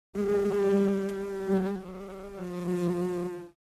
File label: Bumble bee buzzing